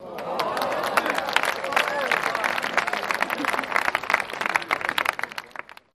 Appls Med Crowd Outdo; medium

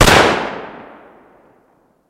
Gun Ar15 Sngl Shot

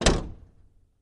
Camaro Door Close Hea, chevy camaro

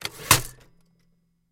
Typewriter Carriag; typewriters

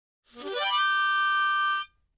Mouth Organ Glissade, harmonica; woodwinds

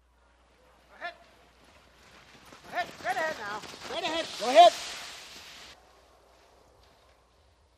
Dog Sled 8 Dog Team By D; mvmt

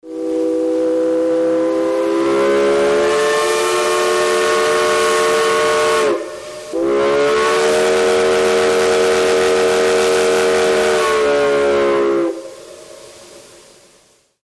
Steam Train Whistle, horns

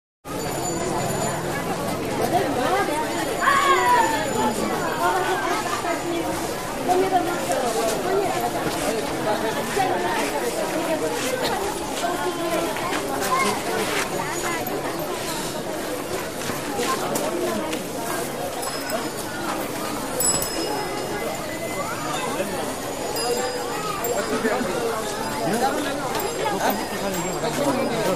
Kathmandu, Nepal - Small Urban Neighborhood Day; nepalese; Kathmandu, Nepal - Small Urban Neighborhood Day Atmosphere - Spoken Hindi & Tibetan

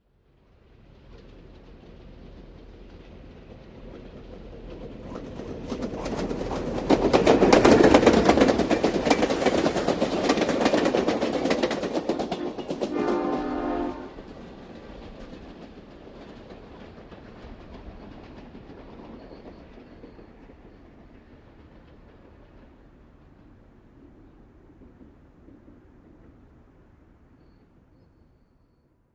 Train By Fast W rail No, bys

